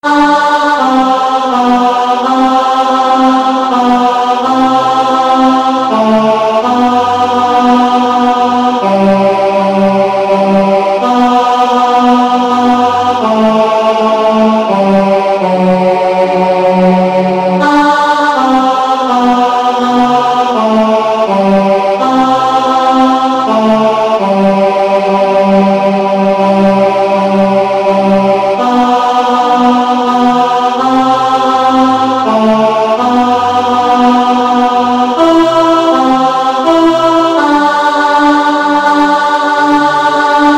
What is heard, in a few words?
Faith Of Our Fathers Tenor; choir